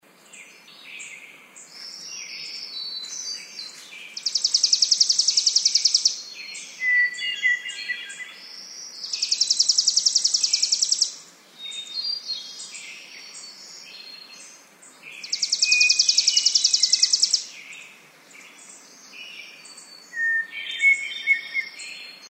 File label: Superior Forest; upper peninsula